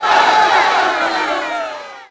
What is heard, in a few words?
CROWD NEG HIGH; Cars; XBOX